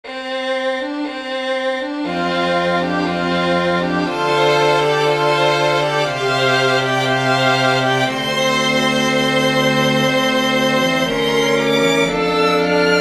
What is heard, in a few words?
Quartet